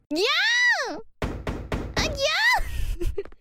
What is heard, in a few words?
gyah, Scream; IRyScream